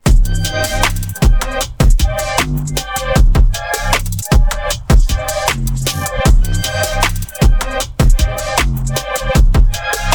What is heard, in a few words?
Bike Chase RAP Mix; The GOAT